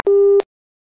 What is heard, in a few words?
ptt begin